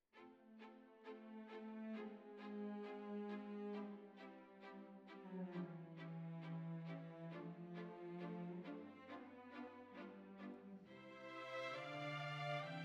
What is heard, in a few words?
Violins Over